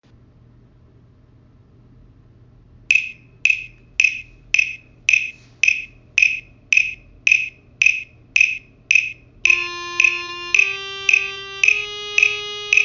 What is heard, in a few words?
clarinet